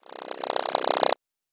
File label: Jelly Charge